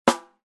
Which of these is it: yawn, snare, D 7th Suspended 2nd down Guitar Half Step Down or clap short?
snare